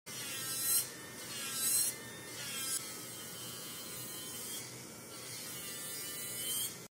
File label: Electric nail file; سوهان برقی ناخن